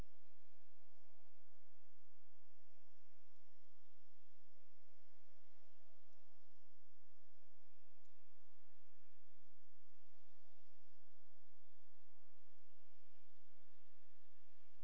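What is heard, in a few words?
hydrophone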